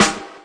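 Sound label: snare, Classic Hip Hop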